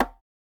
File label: Percs